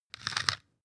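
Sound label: floss pull out squeak, bathroom; haus-garten; badezimmer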